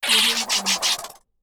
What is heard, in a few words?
alien insectoid monster voice